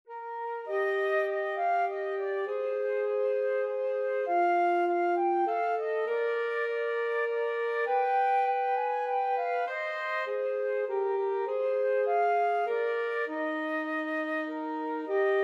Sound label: we wish, flute saxophone duet